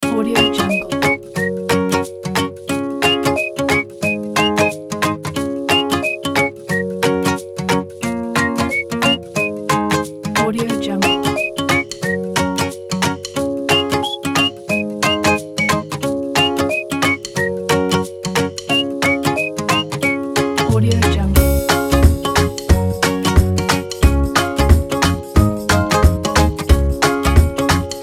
is animated

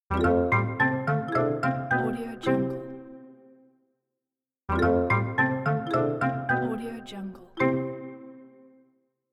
cartoon logo; soundseeds

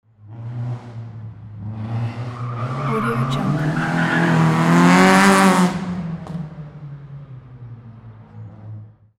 muscle car passing by

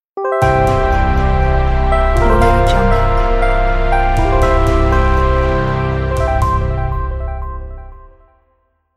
cosmic shine logo

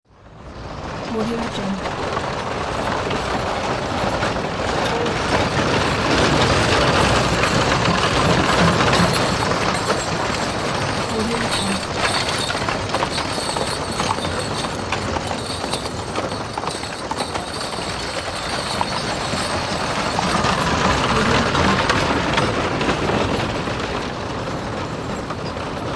horse drawn wagon riding around on road

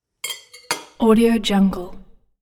knife on plate

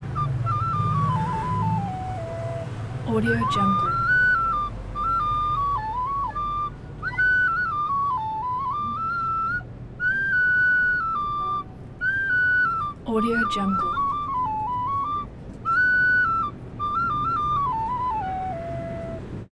street musician harvard square cambridge ma

